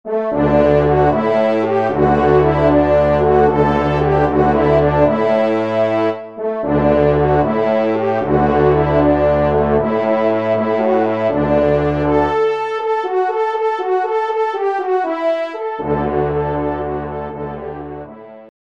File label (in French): TRP